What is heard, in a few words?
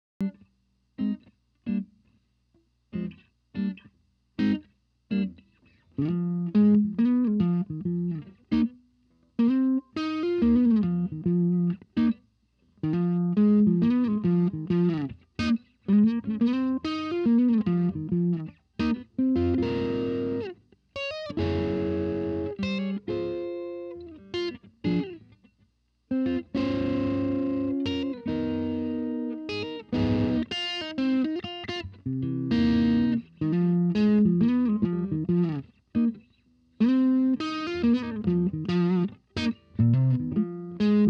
SM58 Amp reamp